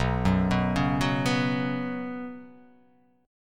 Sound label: B Suspended 2nd chord; arpeggiated; JGuitar